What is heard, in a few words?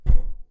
Furniture